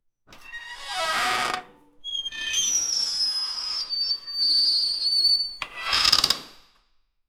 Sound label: an old chest, chest opening